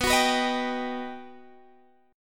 G Augmented Major 7th

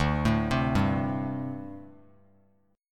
D 7th Suspended 4th, down; arpeggiated; JGuitar

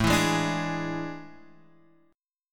A Major 7th Suspended 4th, Guitar, Open F; AM7sus4; strummed; JGuitar

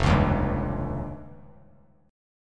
F 7th Suspended 2nd Sharp 5th F Bass Standard; F7sus2#5 strummed

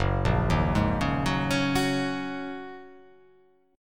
Gb 6th Add 9th Gb down; arpeggiated; Gb6add9; JGuitar

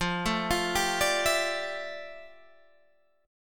F Major 13th Guitar Standard; arpeggiated; chord; FM13; 13,12,15,12,15,12; JGuitar

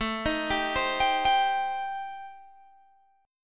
A-Minor 7th Flat 5th, Guitar Open G, chord

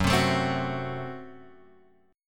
Gb Diminished, down, Guitar, Open G

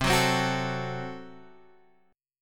F sharp Diminished C

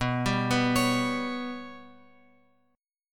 B Suspended 2nd Sharp 5th; Bsus2#5 Chord {7 10 9 X X 9} arpeggiated

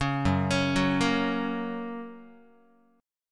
Gb Major, Guitar; Gb arpeggiated; JGuitar

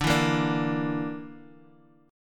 A sharp Major Flat 5th

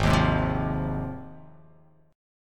G sharp 6th G sharp down, chord

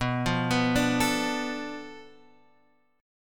B-Minor 7th Flat 5th B down; Bm7b5; arpeggiated; JGuitar

